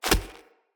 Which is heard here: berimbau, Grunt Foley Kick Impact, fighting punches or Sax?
Grunt Foley Kick Impact